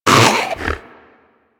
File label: Predator Vocal Tranq Dart Grunt; Warframe; Hunting Critters